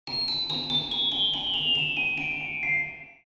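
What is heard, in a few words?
Cartoon celesta descend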